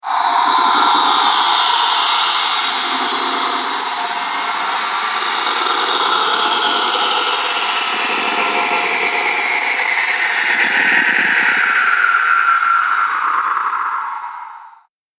Frightening Drone; Scary Soundscape Sound Effects; Horror Sound Effect

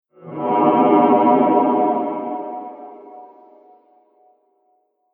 Ghost, horror sound effect; Ghost Sound Effects

